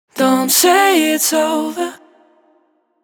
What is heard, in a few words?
135bpm vocal hook wet Fmin